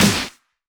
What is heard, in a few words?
Snares; Vengeance - Essential Clubsounds Vol.4